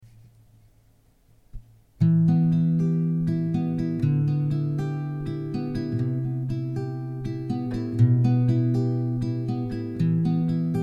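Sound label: Em 120 guitar arpeggios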